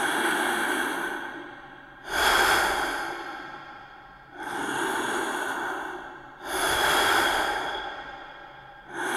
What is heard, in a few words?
generic breath loop; knights of the temple infernal crusade